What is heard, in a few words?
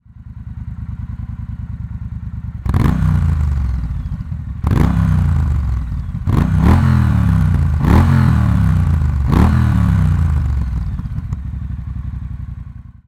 Bobber Opened, TRIUMPH